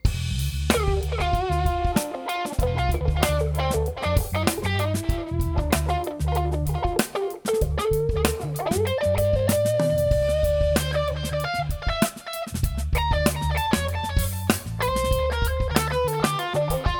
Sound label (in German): Kompressor Vergleich Original Live Band Musik